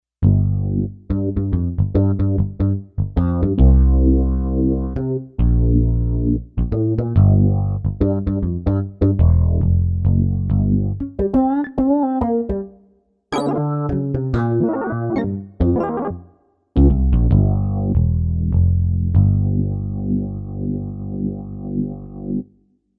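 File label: Bass FUNKY PICK; Digital Synthesizer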